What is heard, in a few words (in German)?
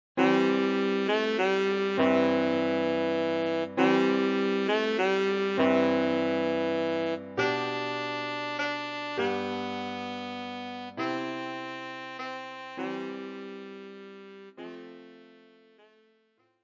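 Tenorsaxophon; Stille Nacht heilige Nacht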